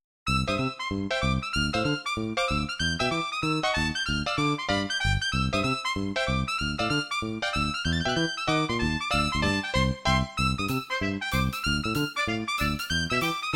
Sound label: Tap Dance